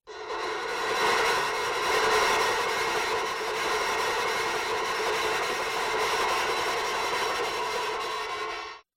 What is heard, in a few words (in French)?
FROTTEMENT METAL